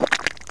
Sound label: splatch; planetquake; planetcnc; ra